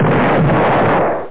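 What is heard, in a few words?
explosion underwater; Worms DC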